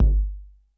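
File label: analog kick; 90 sSampleCDs; Crypton - DrumBase5000; ANALOG KITS1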